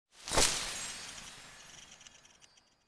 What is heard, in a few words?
Field Arrival